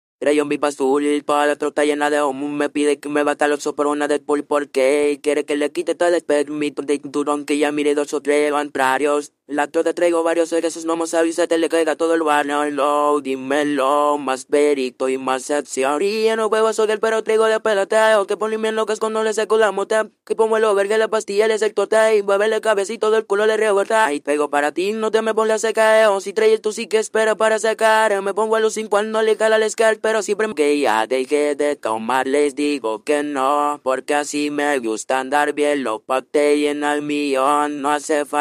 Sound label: Vocals; DIABLO ARTIFICIAL VOZ